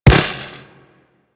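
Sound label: Web Projectile Land; Warframe; Corpus; Arachnoid Wraith; Abilities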